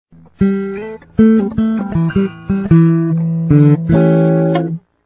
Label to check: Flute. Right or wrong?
Wrong